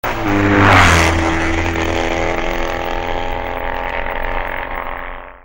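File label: Triumph, motorcycle; 1967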